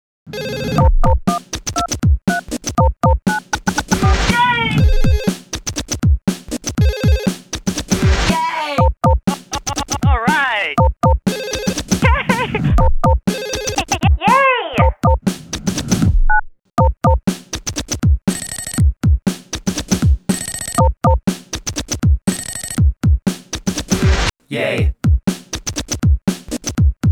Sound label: bed yay